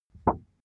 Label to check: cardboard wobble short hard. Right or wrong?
Right